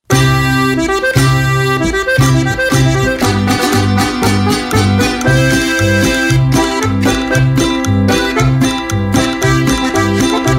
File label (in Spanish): la marcha de zacatecas instrumental; latino folk songs